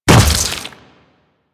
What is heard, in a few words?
Step Flesh, Footsteps; Enemies; Warframe; Grineer